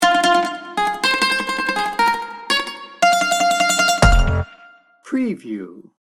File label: tango passion sting bumper